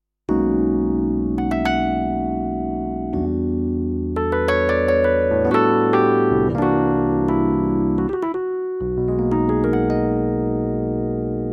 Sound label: yamaha tyros5 suitcase tremolo